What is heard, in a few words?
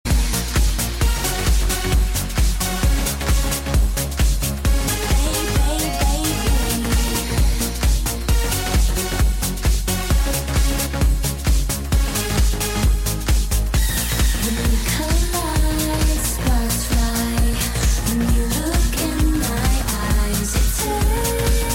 cool fast music tone ringtone